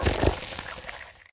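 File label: ice scorpion damage, monster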